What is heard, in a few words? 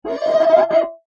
Foley Glass Squeak Clean Window Single; Squeaks; Objects